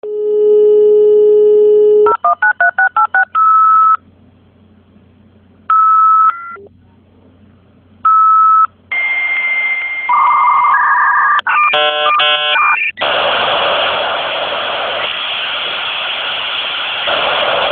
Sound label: 56K Modem Mono Ringtone